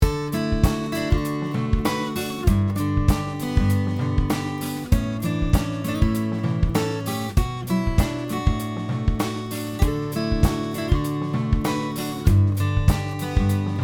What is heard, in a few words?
prism sound titan api